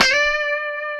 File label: D 5, COMPR STRAT, Guitars; Spectrasonics; Hans Zimmer Guitars VOL-1